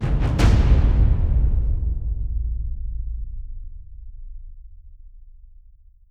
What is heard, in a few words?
Mission Complete; Eon Altar